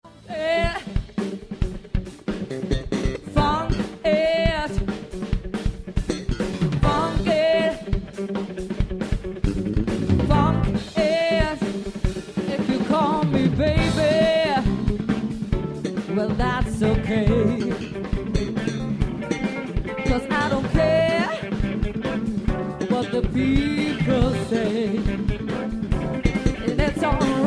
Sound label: funk